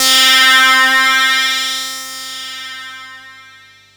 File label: Anasound Long hi C, Industrial Analog Single Notes; Sonic Foundry (Sony Creative Software)